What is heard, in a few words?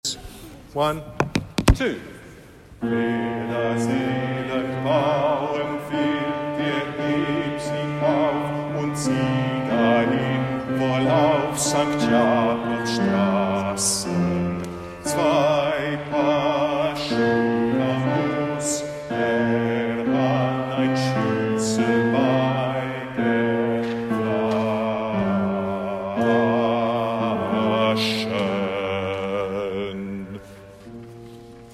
Canto ultreai bass; citysings